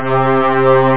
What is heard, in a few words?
Orch String; MarcDrive